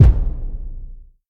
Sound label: warm sounding kick single shot a key; kick drum samples